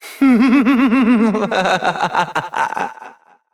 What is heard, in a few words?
Evil Twin Doppelganger Laughter; Battle Barks; Warframe; EntratiDoppelganger